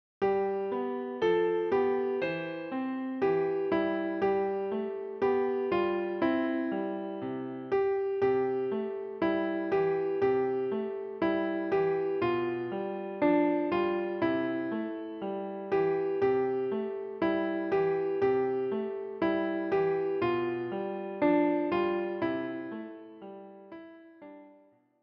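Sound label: piano; Ihr Kinderlein kommet - für Klavier; Weihnachtslied für Klavier, inkl. Fingersätze, mit Vorspiel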